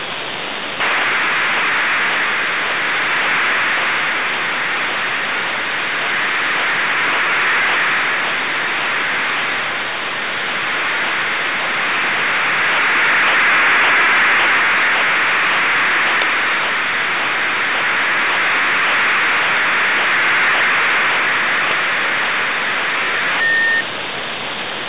15835usb bpsk 1333 bps

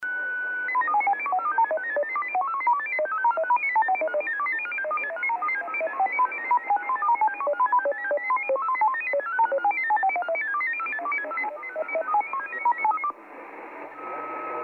tones full

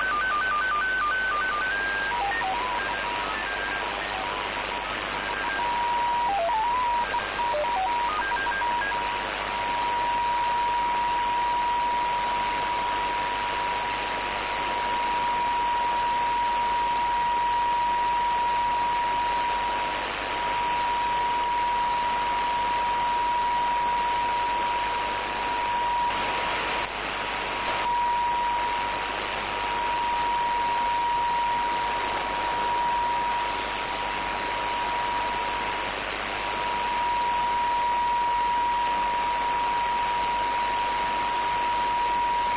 crowd 36

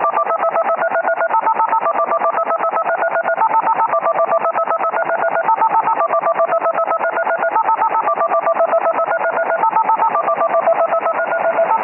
9128 USB DTMF 1238

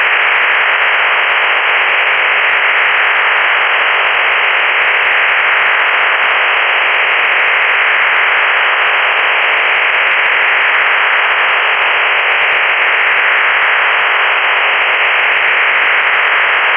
ofdm

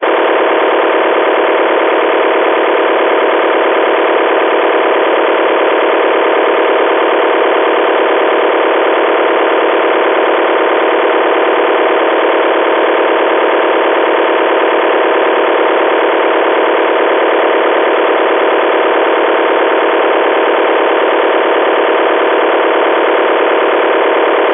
signal 450 mhz

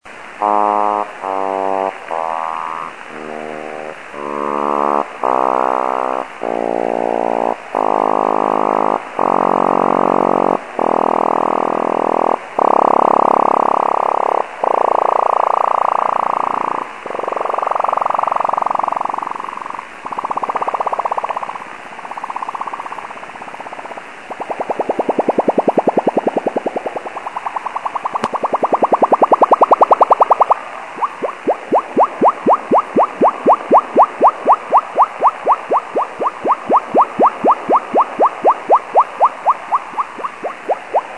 trumpet

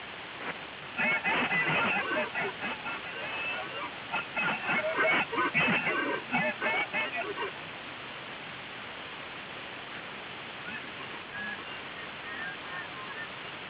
scream fsk-2 shift 200 hz speed 200 bd

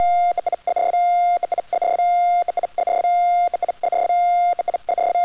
signal

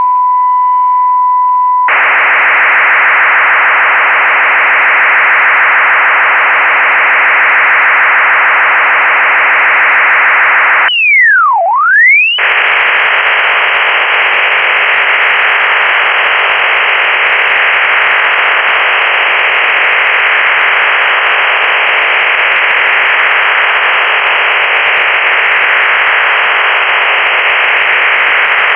ofdm

